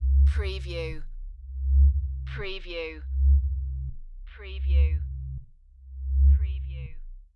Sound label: Reactor